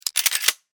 Steampunk Gear Lever, Scifi, Steampunk, Gear, Lever; Science, Fiction; BlastWave